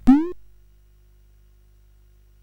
Paddle Mania, CSG8580R5

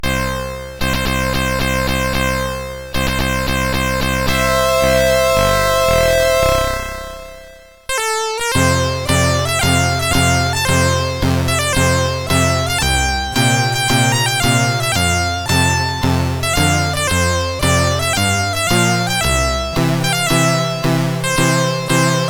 Belgian National Anthem, CSG8580R5

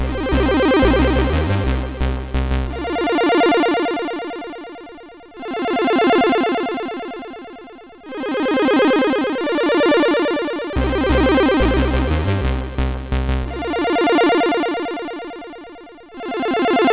Mic Tune, sid MOS6581R3

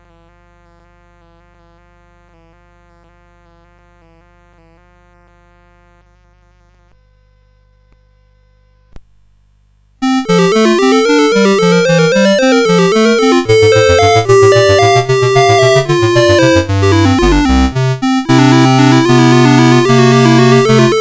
Trio from Sonata, CSG8580R5; Op2 No1

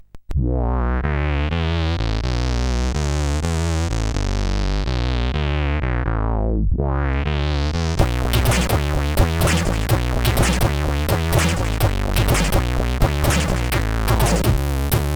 Meow, CSG8580; CSG8580R5

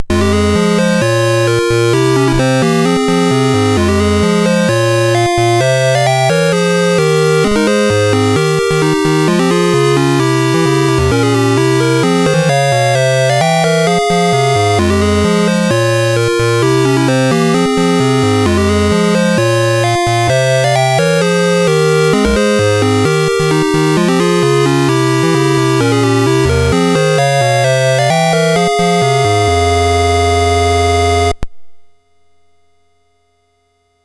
Dragonworld sid MOS6581R4

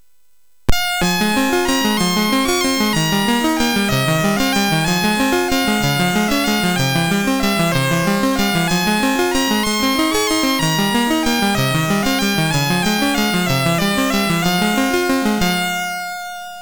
Music Demo Version, MOS6581R3; hvsc

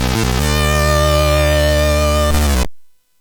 Jingles; hvsc

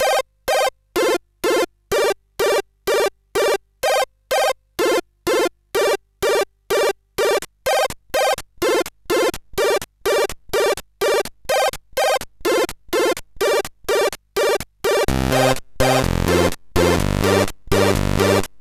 Disco Disco, CSG8580R5

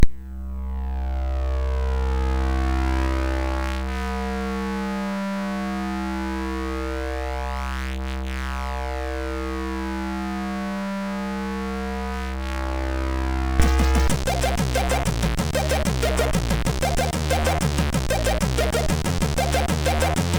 X-Ray, MOS6581R3; hvsc

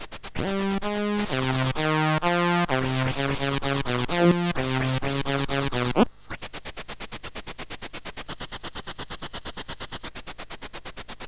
Scratch II, MOS6581R2

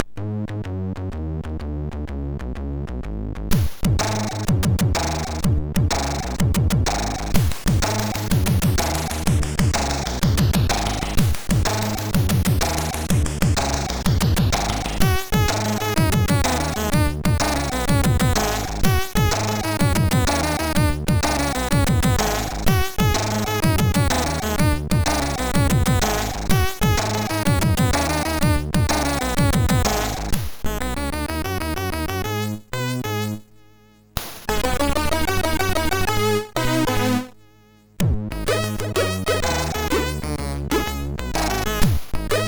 sid MOS6581R2

